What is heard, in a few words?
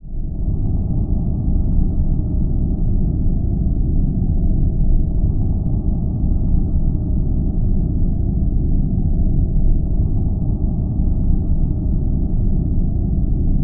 California Rumble